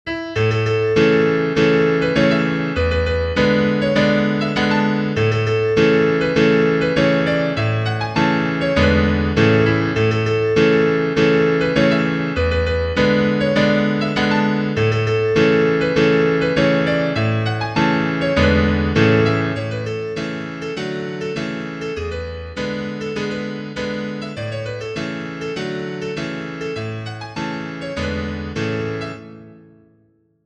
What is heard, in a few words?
accordion